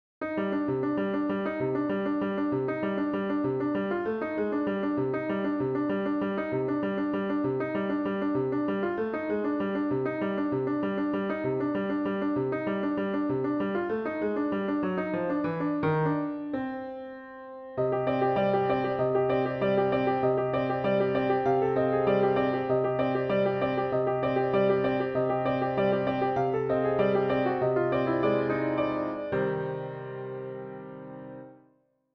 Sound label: miniature in jazz, piano; pieces pour piano